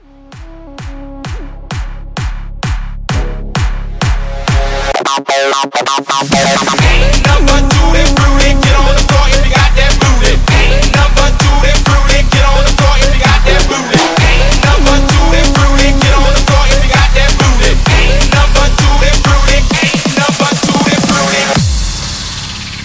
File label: House Mixshow 130 CLEAN